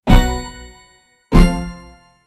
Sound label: nostalgic victory sound